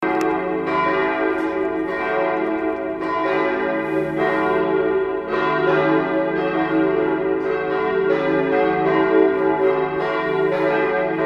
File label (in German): kath annen Glocken